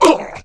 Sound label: jump